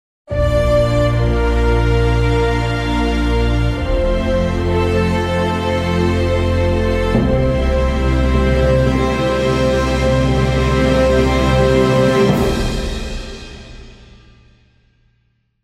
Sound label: stinger; Sky Moves (Stinger D); M. Sander